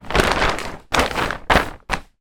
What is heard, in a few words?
twister game sheet lay down on floor